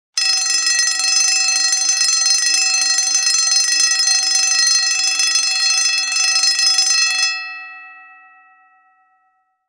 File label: Emergency bell fast ringing